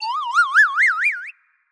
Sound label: Stun; Legend of Keepers (gamerip) (2019)